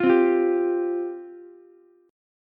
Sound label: C Suspended 4th C, Ukulele; Csus4 strummed; 10,12,13,10; JGuitar